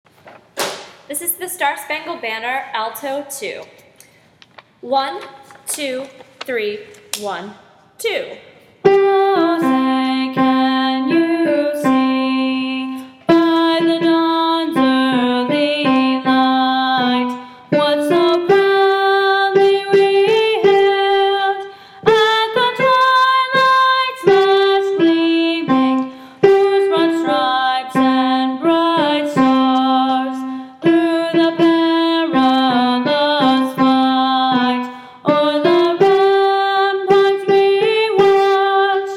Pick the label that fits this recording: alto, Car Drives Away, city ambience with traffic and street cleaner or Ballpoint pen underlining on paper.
alto